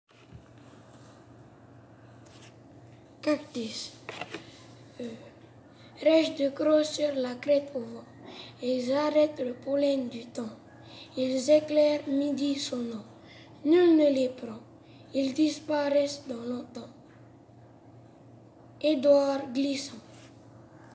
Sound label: Falcon